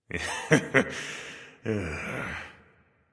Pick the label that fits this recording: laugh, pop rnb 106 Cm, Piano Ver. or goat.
laugh